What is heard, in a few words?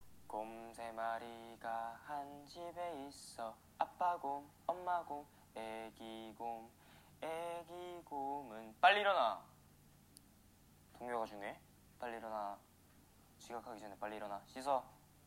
Children Song